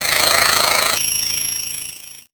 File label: Title Transition Evolver Electrical; Production Element Title Transition